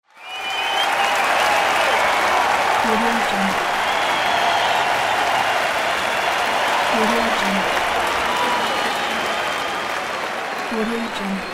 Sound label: crowd cheer